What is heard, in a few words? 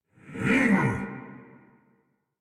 Attack Effort; Warframe; Dax Heavy; en